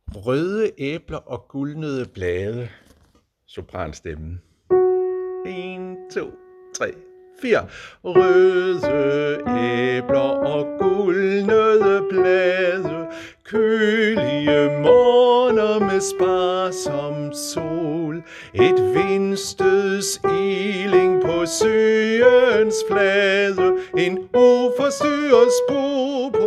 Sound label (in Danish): sopran; harlev koret